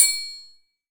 TRIANGLE, SR-16 DRUMS; EdgeSounds

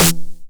909 SD Tape Sat

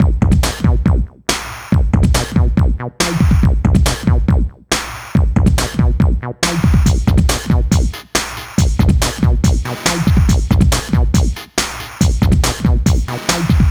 Hardcore Breakbeat, 140 Bmaj; Big Fish Audio; Hardcore Breakbeat Action; Goliath

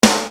Snare Dr

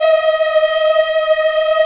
D#4 CHILD, Choir; CHILD IHP

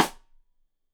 Side Stick Stereo; Deeply Sampled Drums; SMDrum

